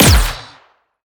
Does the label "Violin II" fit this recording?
No